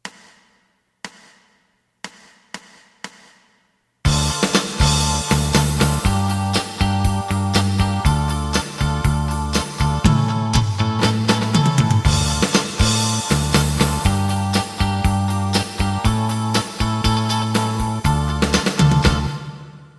lead; エレキバンド用カラオケ

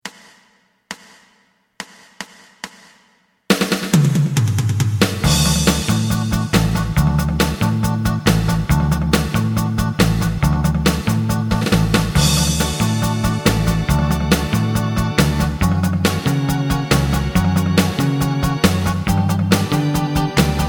lead

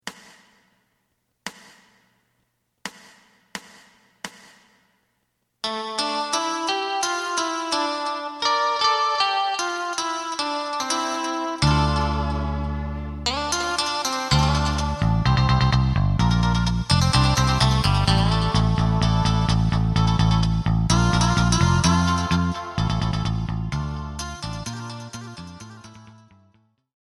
drum

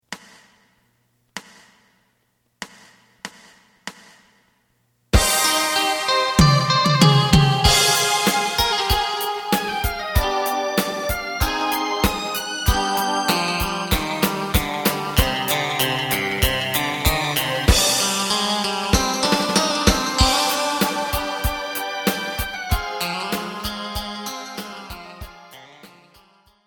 bass; さのさ節

